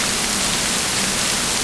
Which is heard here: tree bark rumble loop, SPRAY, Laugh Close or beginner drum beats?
SPRAY